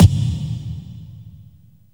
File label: KICK PRO -L, Disco House; USB Soundscan vol.20 - Fresh Disco House I